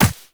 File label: Operator Walk Ice, Footsteps; Warframe